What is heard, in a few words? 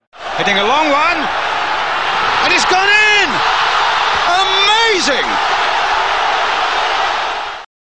goal